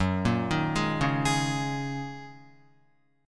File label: F sharp 6th Add 9th F sharp Guitar; F#6add9 arpeggiated